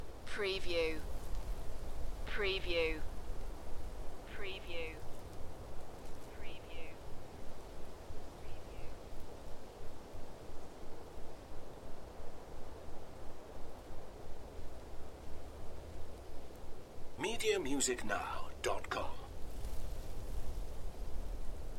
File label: Windy Welsh forest ambiance; beanstalk audio